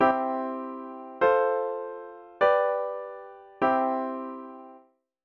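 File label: Secondary dominant exercise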